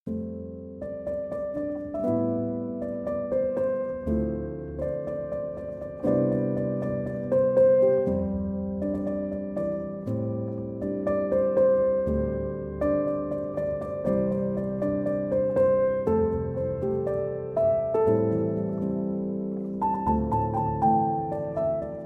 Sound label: Piano Instrumental